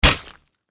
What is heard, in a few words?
Battle Axe Strike Human Impact Hit Large Heavy Blade; Medieval; Warfare